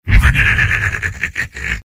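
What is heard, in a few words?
laugh evil, male; soldier; tf2; garrysmod; mvm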